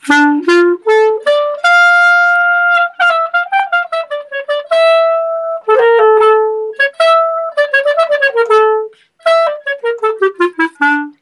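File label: Bulgar, Klezmer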